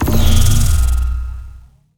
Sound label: safe area